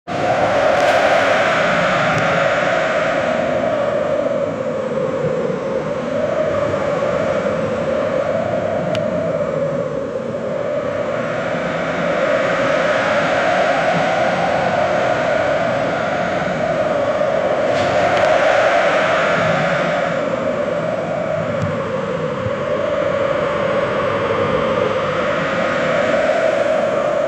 cinematic sounds of a sur, beach

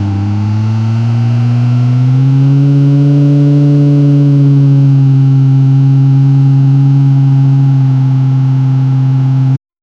smooth and clean car engi

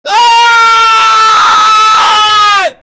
man screaming a shaky voi

